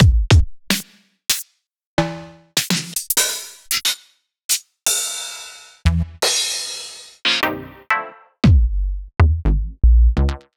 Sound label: drum